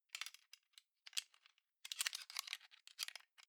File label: plastic frame small mechanics rattle